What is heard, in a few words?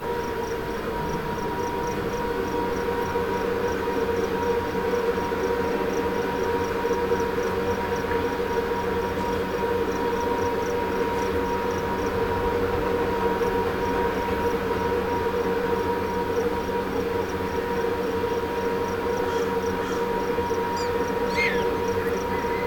barn, ambience; sf2e guilt of the grave world